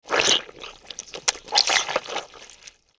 slimy space creature movement

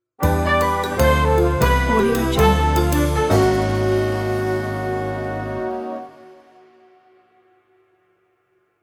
jazzy sitcom stinger transition

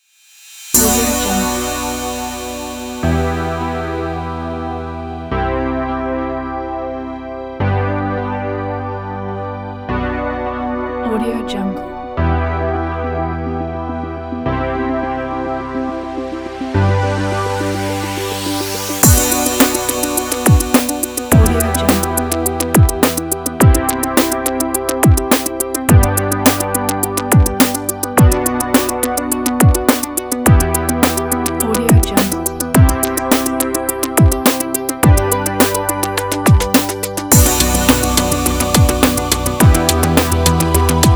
electronic tech

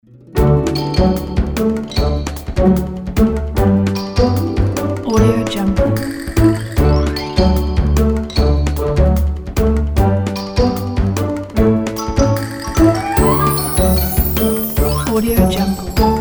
cartoon

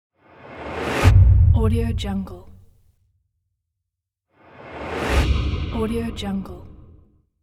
hitting transition swoosh

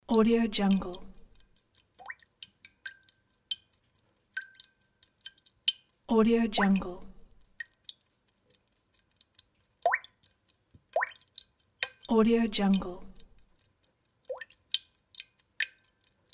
melodic water drops loop

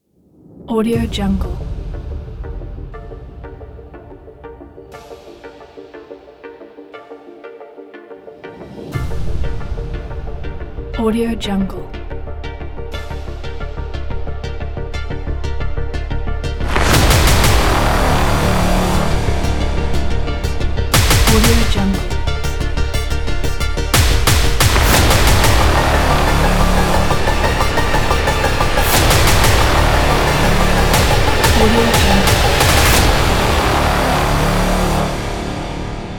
trailer impact

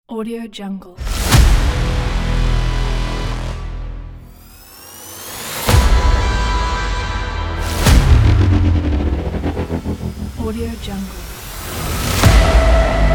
cinematic epic intro trailer

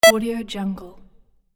barcode scanner beep

